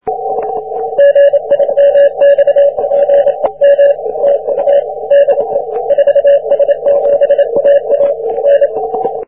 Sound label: 40m cw; 4s7aag